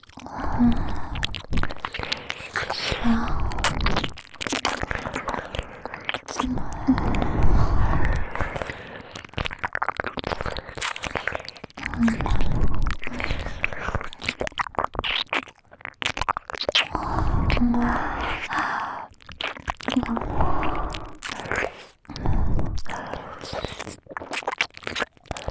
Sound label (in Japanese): 耳舐め; 生意気ナツキはナツキに勝ちたい! ～夢の中でも勝手にイっちゃう弱くて強気なボーイッシュ×2～